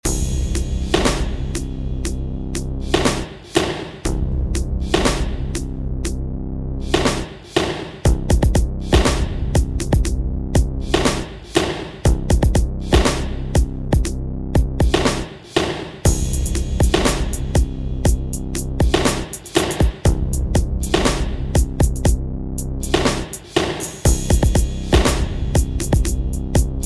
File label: 120 bpm half time beat